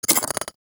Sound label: Rifle Mech, weapons; Gyre